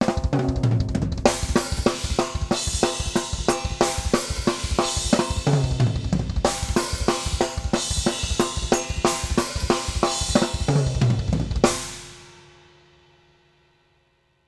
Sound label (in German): drumset gesamtes set metal lauf; img stageline